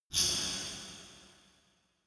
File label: dark resonator hit single soft